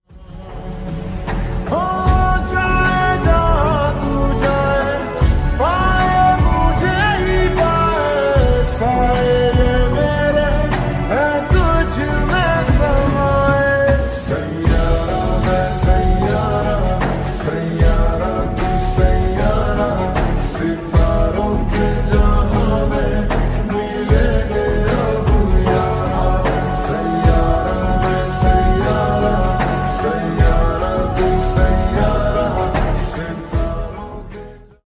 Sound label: hindi love story ringtone